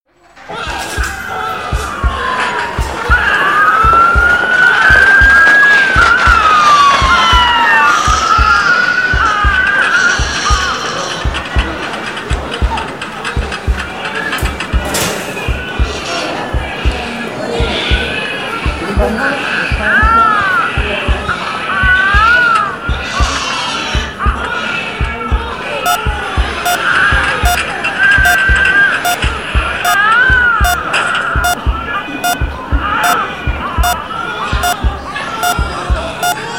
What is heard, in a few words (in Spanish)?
Sonidos hospital